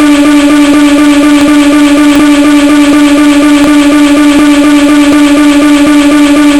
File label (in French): acou, acouphene